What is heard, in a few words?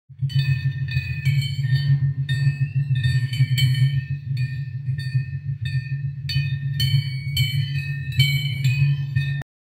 temples and shrines